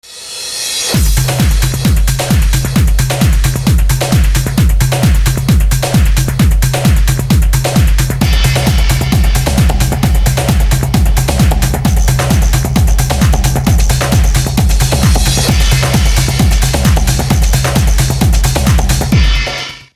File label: techno